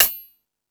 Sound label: Tool Kit, Drum Machines E-MU Pro-Cussion